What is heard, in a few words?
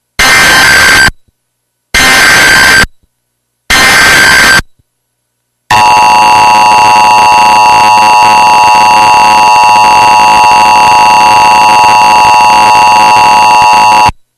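emergency alert system; earrape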